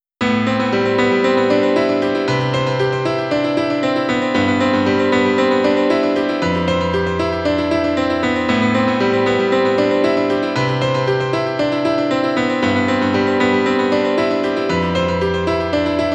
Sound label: pf delay 116